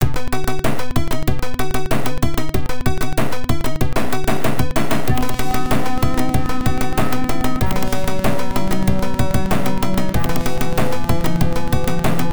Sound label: Heavy Metal; Exile XZR; Genesis